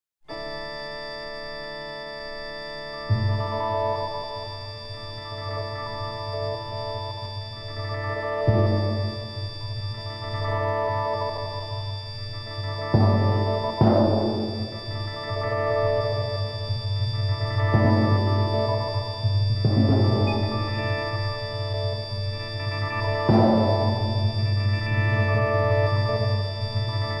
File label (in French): orgue en suspens